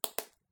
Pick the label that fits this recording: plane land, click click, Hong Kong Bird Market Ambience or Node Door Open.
click click